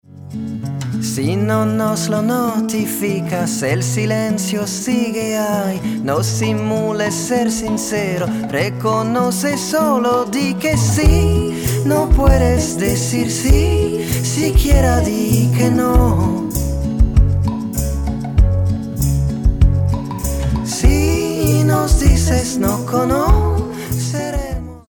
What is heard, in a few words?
male french sing comercial; ideasonora